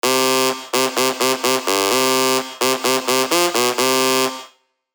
dirty dutch leads; Dry Heat; Revealed Spire Dirty Dutch Leads Vol. 1

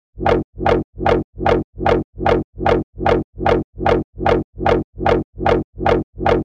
Reverse Bass Loop F# (150 BPM); revealed reverse bass loops vol 3

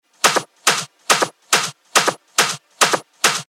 techno loop 140 BPM; Jafar; revealed techno top loops vol 8

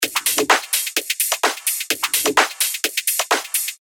128 BPM; Revealed Top Loops Vol. 4

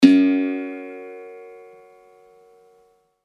percussion F#; revealed percussion vol 1

